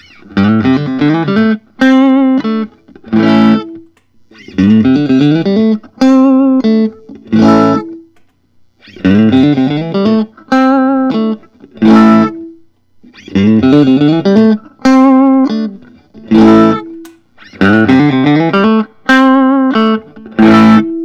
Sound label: tom anderson hollow drop top koa